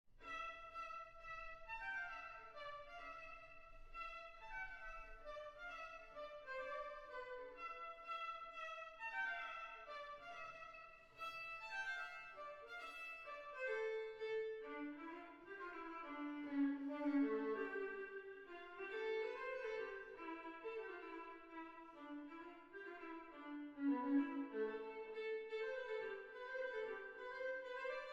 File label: Tavern; Mount & Blade: Warband; Android